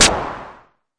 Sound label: mbrute noiz, Arturia MiniBrute